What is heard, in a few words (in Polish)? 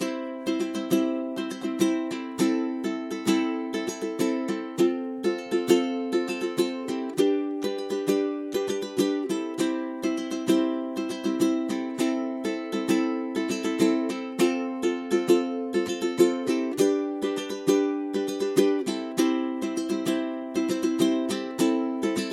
bicie ukulele